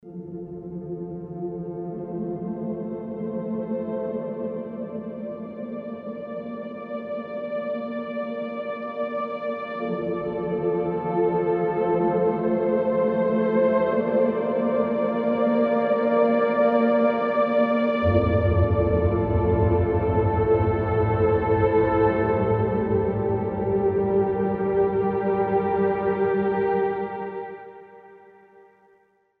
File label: Fabric XL; Obelisk